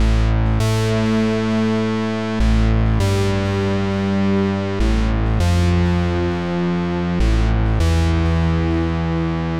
hard saws; Loops; Electronic

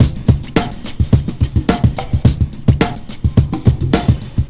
conga longa, funky drum loops

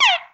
dolphin

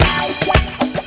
guitar, Loops Phonky

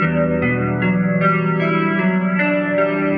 guitar, Sad

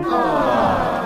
"ah" crowd adorbs, Voice; ooh ahh

